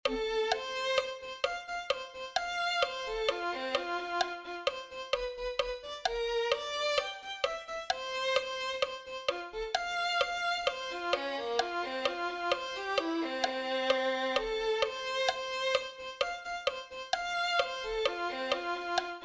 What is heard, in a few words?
violin